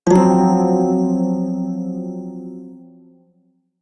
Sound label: Prime Shawzin Hirajoshi Chord F; Music; Warframe